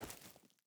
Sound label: footstep burlap dirt walk; Rust